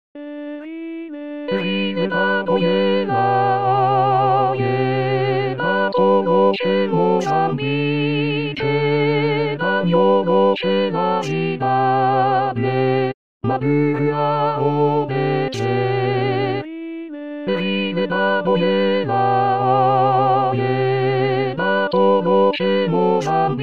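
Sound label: bass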